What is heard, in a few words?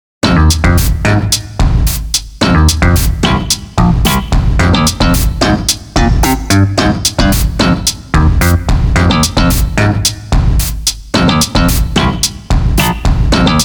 Bass & Drum